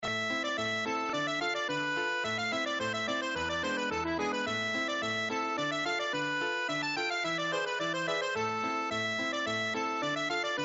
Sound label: polka